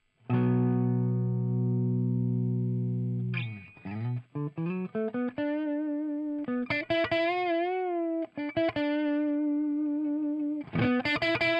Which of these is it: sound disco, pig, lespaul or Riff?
lespaul